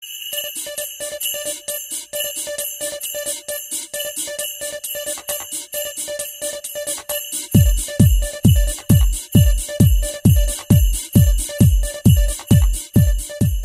blipmas sms

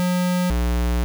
klingelton error

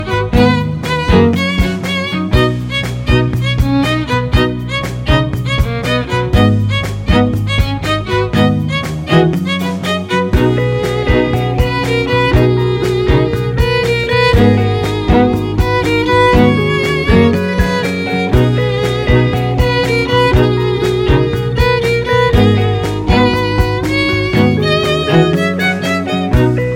dance vibrations

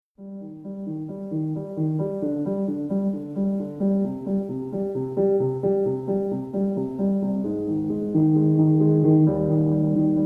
piano sms

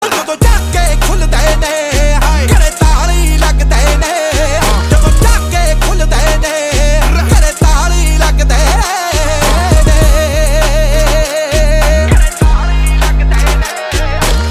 lock, Musik

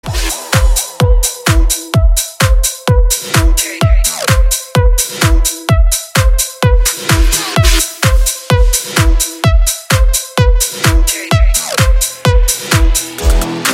basshouse alarm

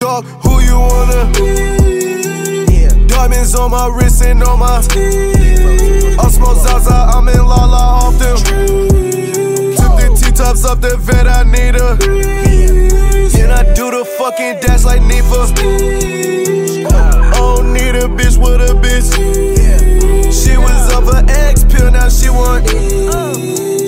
weeeeee, Rap

